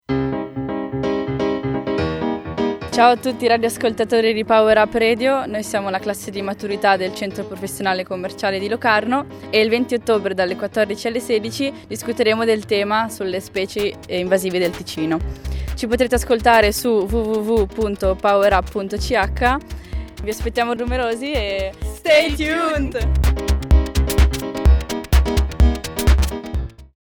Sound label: Jingle, radio; powerup-radio 2017